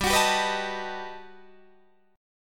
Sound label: G Augmented Major 9th, Guitar, Open D